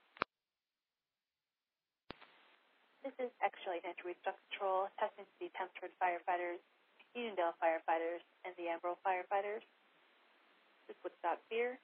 fire page received

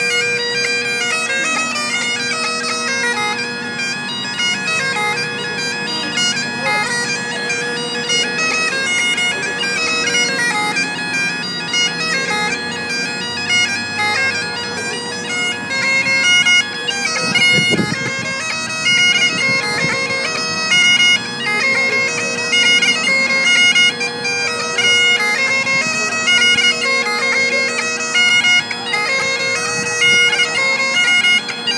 bag and pipes

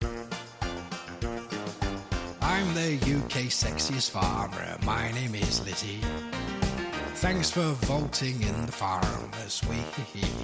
jingle

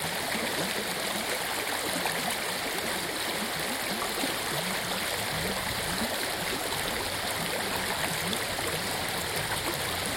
river Rye Rievaulx North Yorkshire binaural

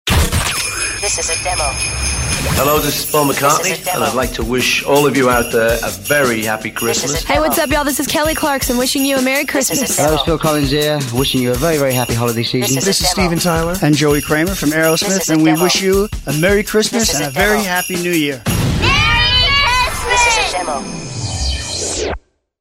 christmas jingle

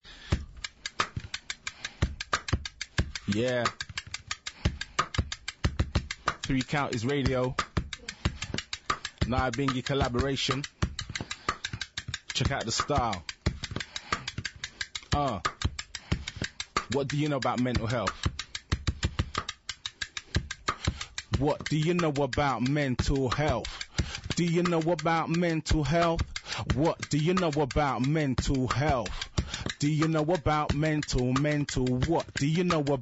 mental health rap

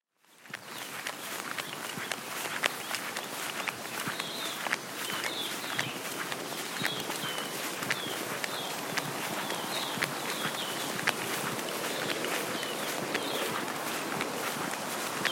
South African birdsong reimagined

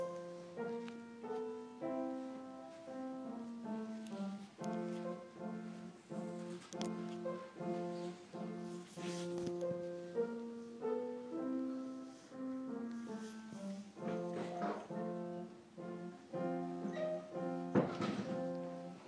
playing music assembly

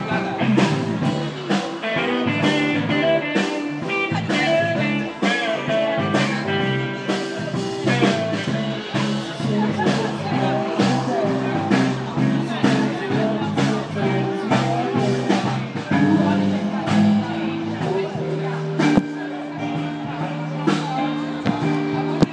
open air music

